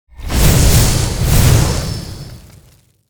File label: light slash